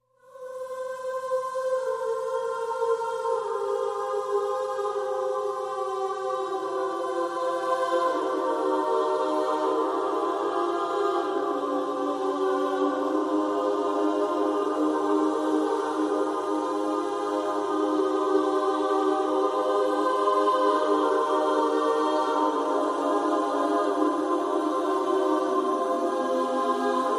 Choir Only

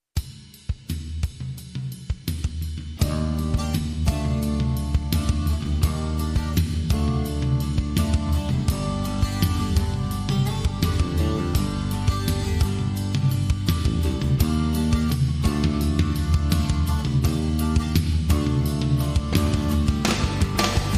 No Guitar Melody

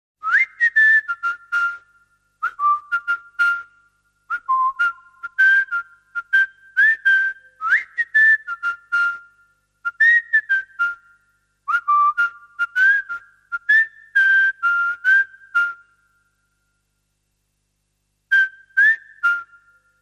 Whistle Only

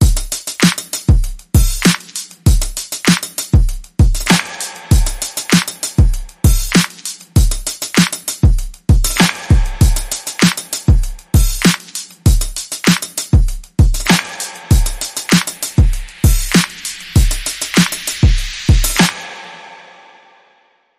Get Funky Beat

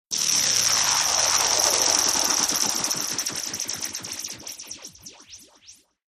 Phasers Down

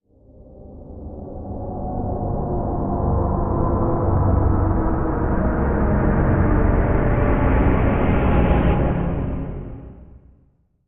Black Hole

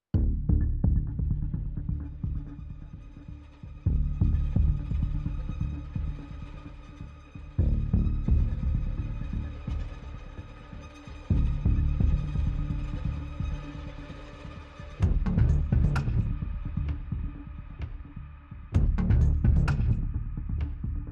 Orchestra and Synth Only

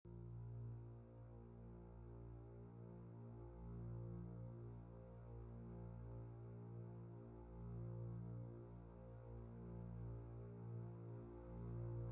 Only Strings

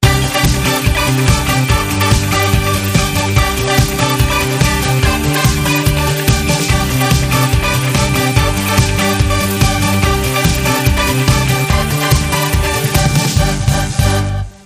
Jingles

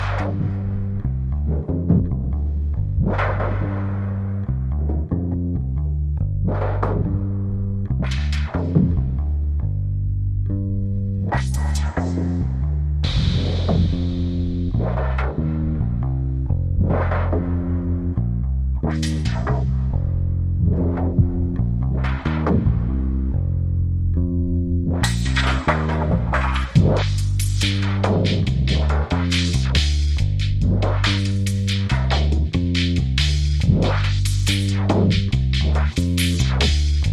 Drums and Bass Mix

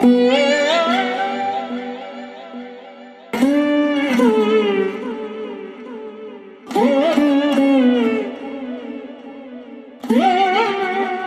Sitar And Tea, Link Sting

